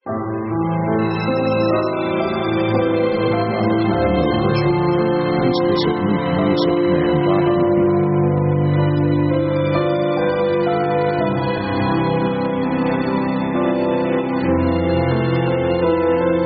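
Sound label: orchestral Large Concert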